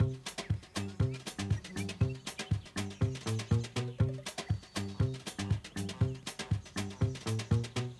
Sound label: ambience free spin; flowers